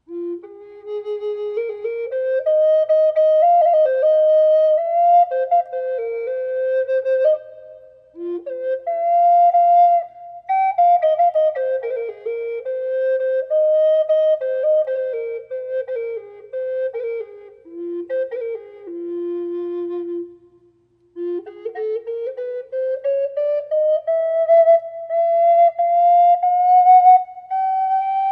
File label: Bocote F minor reverb, flute